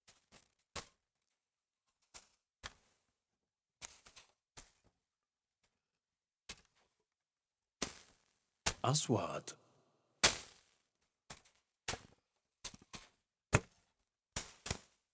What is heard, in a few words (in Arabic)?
خطوات على أرض صلبة; sound effect; رمال